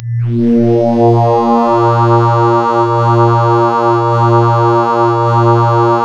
A#, Synclav FM; Big Fish Audio; SPACE1

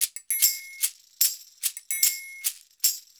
150 MIX, Percussion Loops

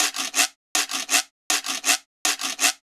Quiro, Latin Percussion

